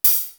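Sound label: hi-hat; East West; Global Sounds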